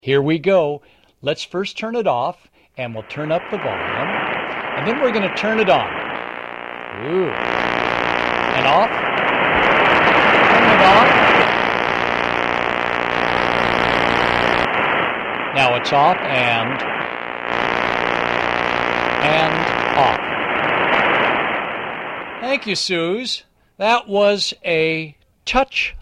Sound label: touch lamp, noise